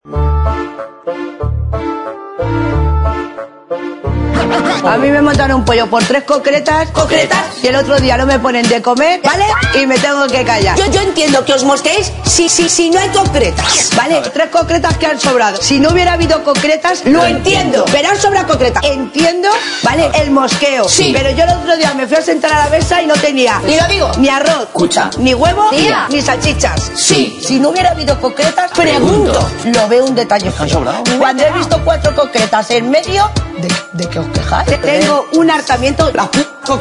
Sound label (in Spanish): rap; belen esteban